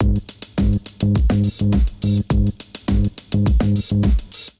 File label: Kit groove 104 bpm; MIDI Clips; Wayman Kit groove; Drive and Glow; Ableton; Factory Packs